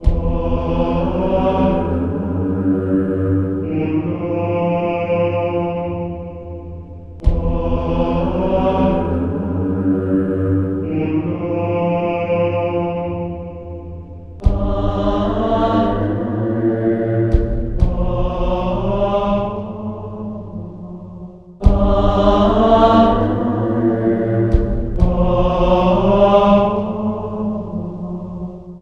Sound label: aztec music; Alien Arena